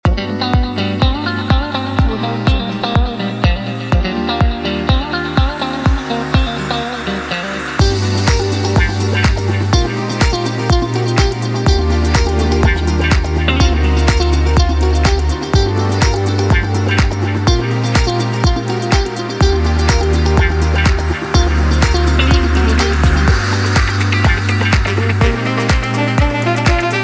summer fashion house

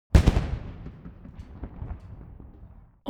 weapons rifle salute fire

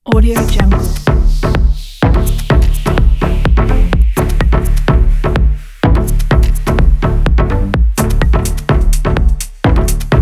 fashion chilled house

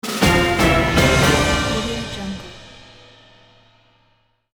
dramatic orchestral breaking news jingle

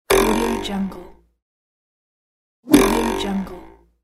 spring sfx

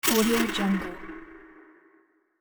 metallic hit and echo; sound ideas

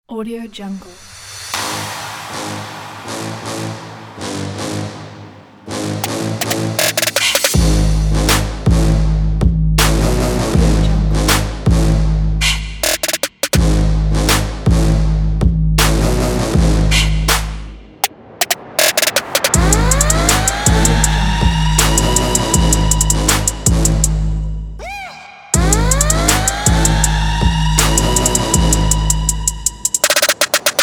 trap

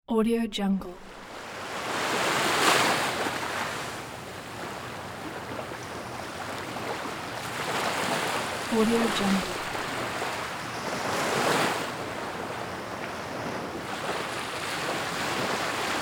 beach waves

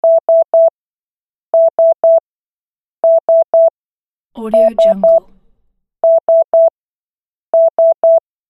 alarm signal

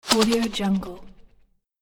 game drained effect

